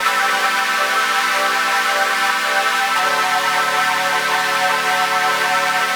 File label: Organ Hissin; Keys; Keyboards